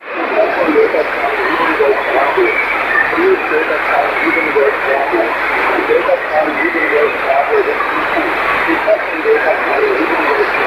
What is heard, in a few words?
SSB -130dBm; bhi; SN-Vergleich